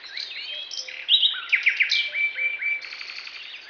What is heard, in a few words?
Birdsong Loop